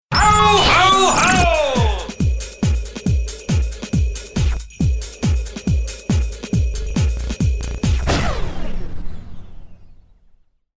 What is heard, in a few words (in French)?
Base rythmé